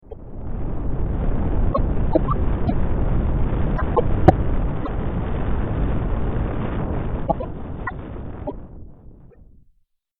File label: earthquake